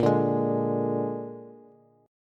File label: Bb 7th Bb down Bass; strummed; JGuitar